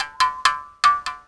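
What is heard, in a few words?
SM MOUTH BOW; Spectrasonics; Heart of Africa 2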